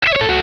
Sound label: Hard rock fold close; Nokia; Audio themes